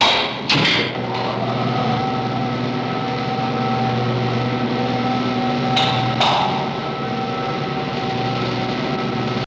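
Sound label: Elevator Start Run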